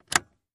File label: Dodge Van Switch Push; switches